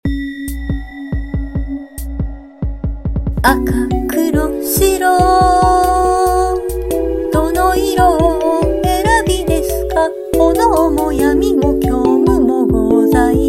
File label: Song (with vocals)